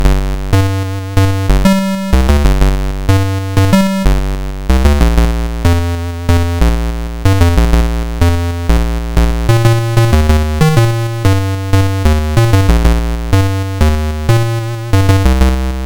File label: Bass; Wheaton Gary